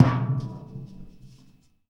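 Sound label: BIG TIN, percussion metallic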